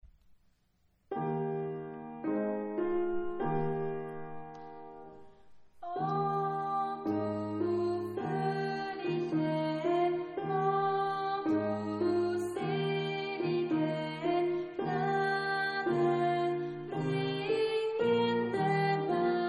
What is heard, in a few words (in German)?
Oh du fröhliche alt